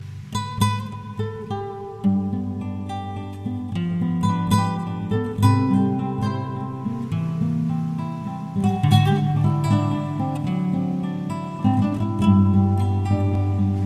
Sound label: Rose Guitar